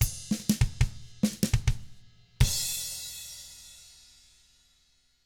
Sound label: FUNKY Drums